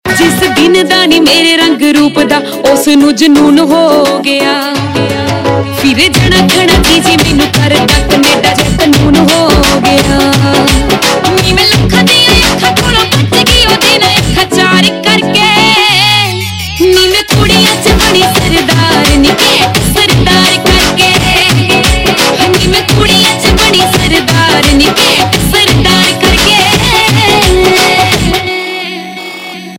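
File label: Ringtone, Punjabi Pop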